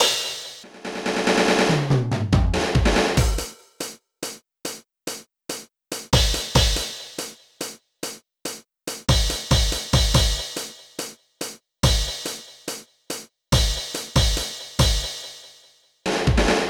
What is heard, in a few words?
band drums; BGM; infinitefusion